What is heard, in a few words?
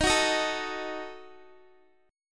Eb Diminished, Guitar; Ebdim strummed